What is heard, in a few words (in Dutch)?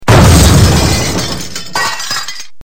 brakent glas